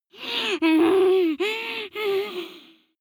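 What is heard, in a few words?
witch moan evil, creatures; kreaturen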